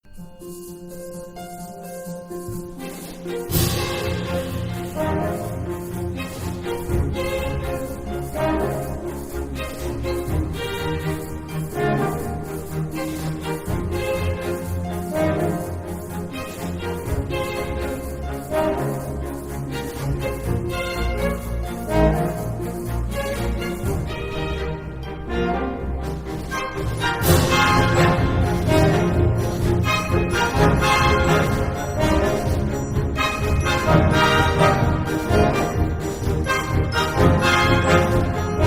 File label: questioning allegro